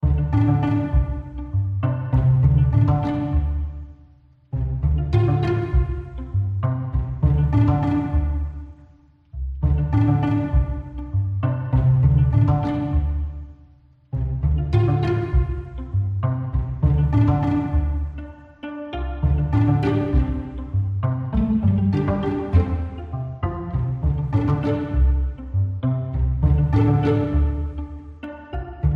Harp and Pizz LOOP; dark mystery

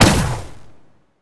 Plasma Shoot

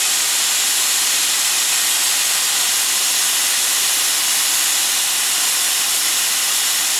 Steam Engine Tractor; starbites

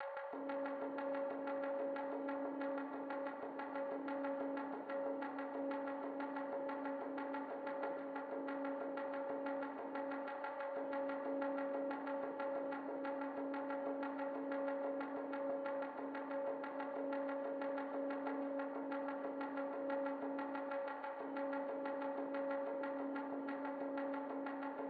Hazy Mallets Pluck; Backgrounds

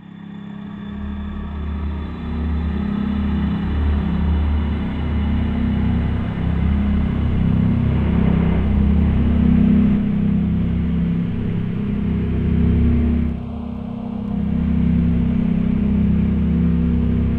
Atmosphere; Garage Bad Trip

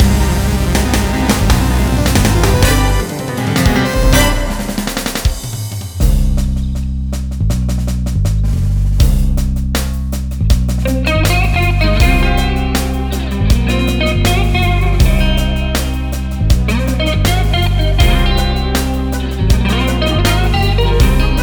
Lose; Heathcliff: The Fast and the Furriest; Wii

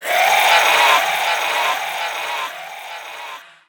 Moon God Death; enemies

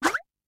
Winning Reward Characters Appearance; hello kitty friends match; android

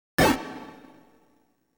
TRAP [Mage Blaster]

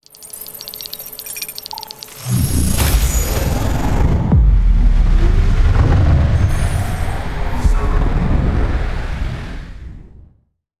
Reactor Link; starbites

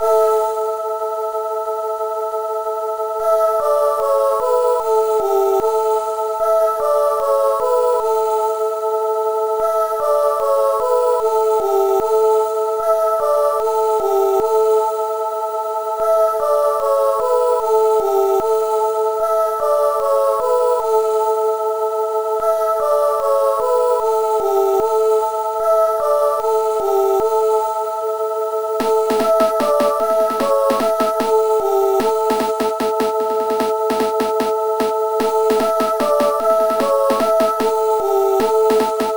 Schottisch; 1869 History Experience Part I; Amiga

